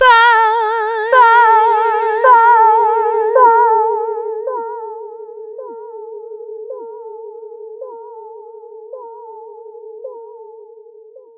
PAAAPAAA, Funky Vocals; USB Soundscan vol.18

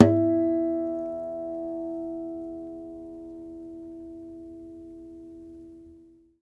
NYLON HARMO

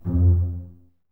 BSS F 2 Pizz, String Ensembles Basses; Miroslav Vitous

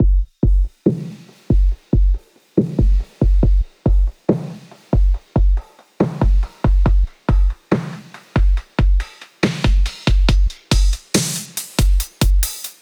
140 BPM Intro Break Drums Full; Vengeance - Essential Clubsounds Vol.5; VEC5 140 BPM Vengeance Intro Kit Root E; Drums & Perc

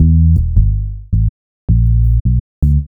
Bass, Futurist Drum & Bass

